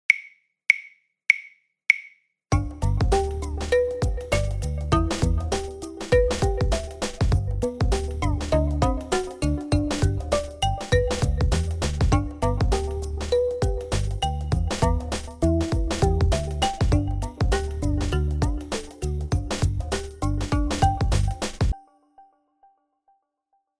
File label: Backing Track